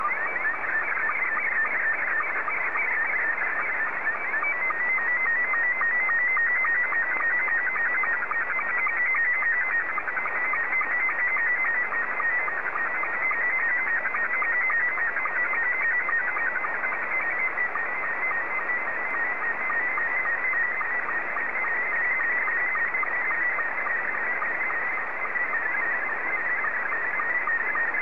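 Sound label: SSTV, Scottie 2; JA3QJQ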